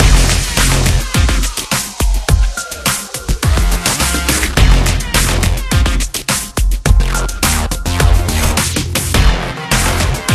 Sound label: Train Background Music; Pixel Gun 3D